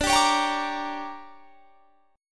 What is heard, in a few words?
D Major 7th Suspended 4th Sharp 5th; DM7sus4#5 strummed